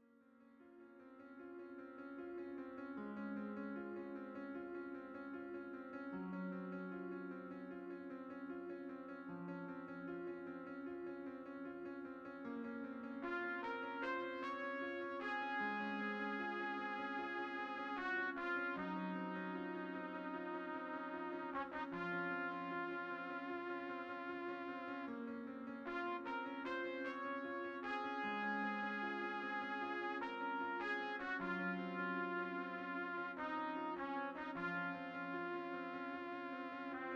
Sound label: Trumpet elegy